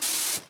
welding bot; ambient; Stellaris

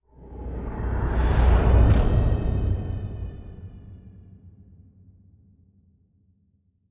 ship juggernaut move; synthqueen; Stellaris; machineage

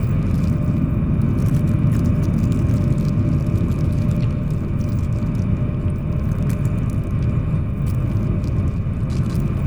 pro ship strikecraft idle; biogenesis

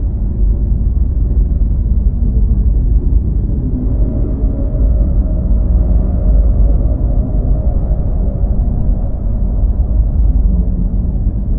fallen empire colossus idle; apocalypse

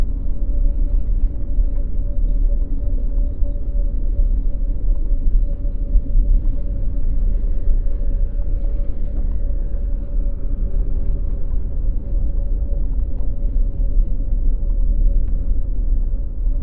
juggernaut lithoid idle; Stellaris; federations

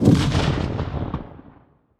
kaiju lightning hit lightning crack; Stellaris; biogenesis; weapons

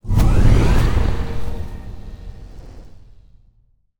necroid moving out colony ship; Stellaris; necroids

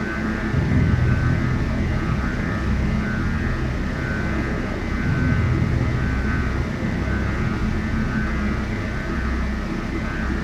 ambient wormhole station idle; Stellaris

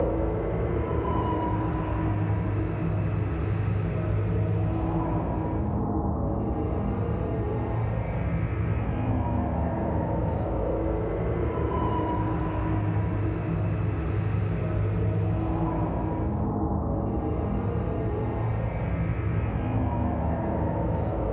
cyb arc furnace phase; Stellaris; machineage; superstructures

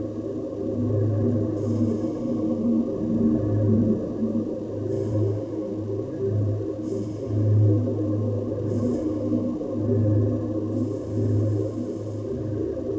toxic world; Stellaris

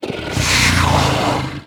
swarm; Stellaris

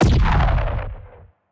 titan hit explosion tonal, weapons; kaiju; Stellaris; biogenesis

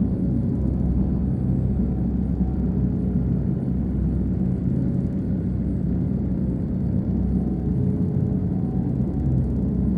ship laser idle; ships; pre-FTL; Stellaris; first contact

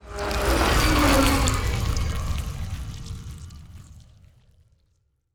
ant ship science move; biogenesis

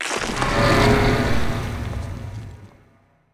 moving out battleship; necroid; Stellaris; necroids

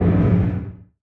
distance energy lance hit, weapons; Stellaris

